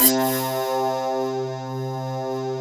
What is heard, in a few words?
SCRAPE C3, Synth; SCRAPY